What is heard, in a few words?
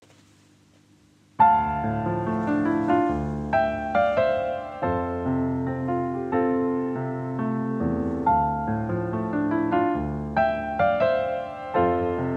piano; Naphill and Walters Ash School